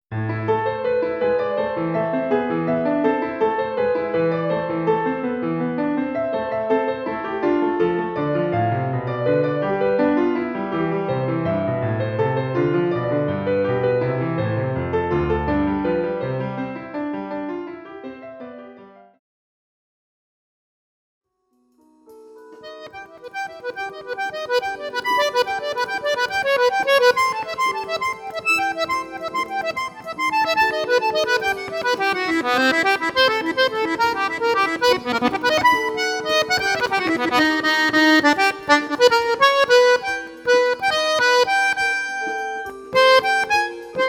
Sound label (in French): musiques instrumental